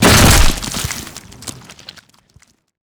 armada rotor impact

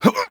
vce lift

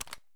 mp7 stock button; Escape from Tarkov

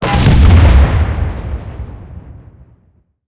cannon 380mm shot; cannons tanks

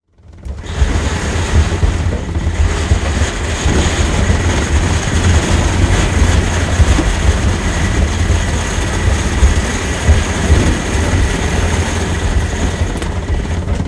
engine broken, tanks; engines; War Thunder

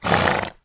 Horse Die Vox; Civ4 warlords

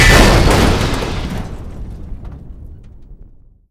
explosion trophy metal sharp; Explosions

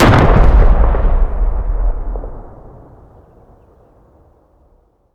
Explosions Explode Distant; DCS

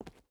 footstep rubber boots concrete walk; Rust

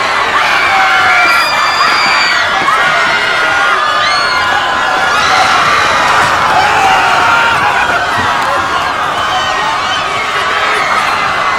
airport crowd terminal loop; Call of Duty Modern Warfare

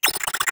drone chirp

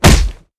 Inf Weapons Foley; Red Orchestra Ostfront 41-45

